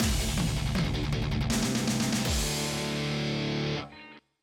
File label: Bgmse Series Op; pro yakyu famista 2020